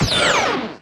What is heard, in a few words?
laser turrent fire, weapons; Stellaris